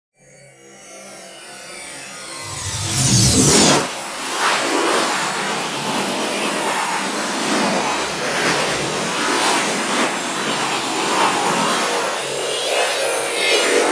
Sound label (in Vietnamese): Am thanh giai phong truyen nang luong